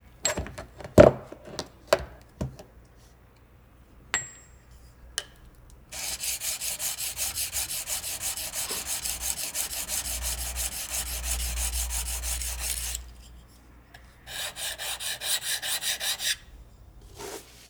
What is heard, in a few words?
craftsmanship